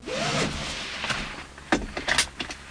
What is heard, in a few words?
seatbelt, cars; WAV Sound Effects; transpo